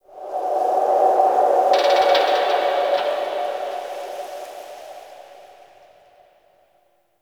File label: Zariman Park Wind; Warframe; The New War; Ambience